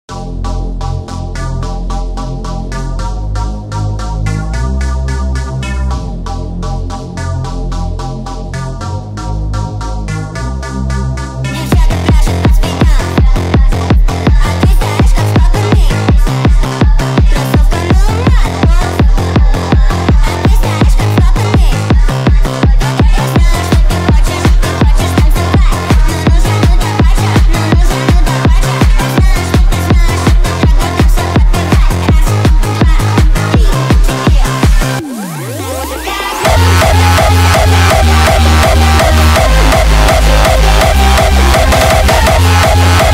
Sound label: russian hardstyle remix